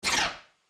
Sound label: Arcade Game Bat Wings; Video Game Sound Effects; Retro Inspired Sound Effects - Think Nintendo, Atari, C64